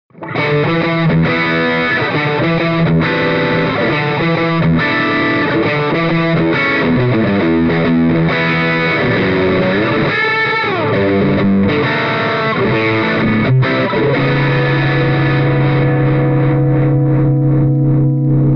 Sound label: Eminence Legend GB128 Distortion Stratocaster